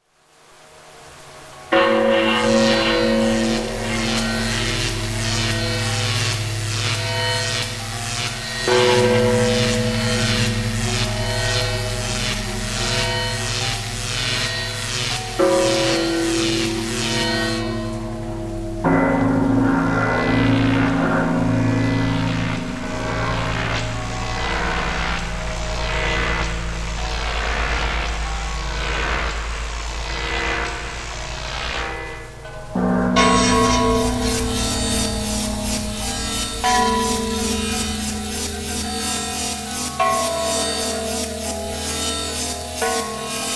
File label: deathrow, horror synth; monstrous horror synth; by super synths